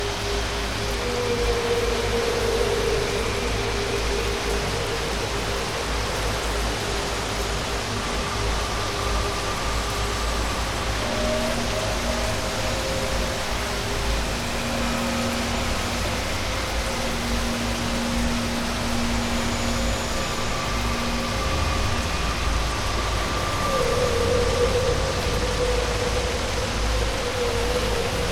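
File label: ambience - rain exterior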